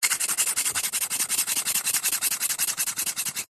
foley wood thin strips rub together fast trying to light fire; zapsplat